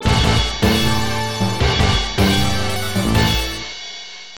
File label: Stage Start